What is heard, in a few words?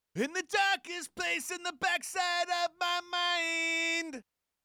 Chorus BG, Vocal Tracks